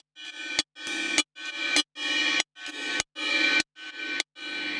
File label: Side chain gated Topline (100BPM); musicradar